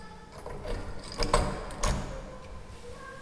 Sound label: key in and out echo hallway; sound effects